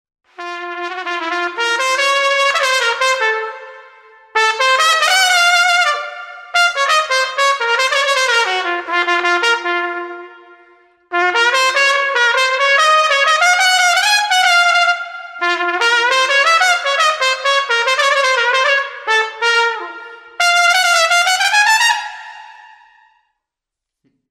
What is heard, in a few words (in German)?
trompete